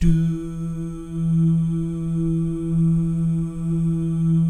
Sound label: DOO GUYS, Vocal Jazz FX; Spectrasonics; Vocal Planet; JAZZ COMBO